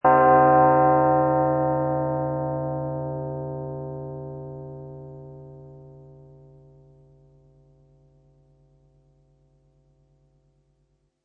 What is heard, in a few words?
Church Bell Or Death Bell With Reverb; Solemn, desolate church bell or death bell sound; Spacious stereo; Lynne Music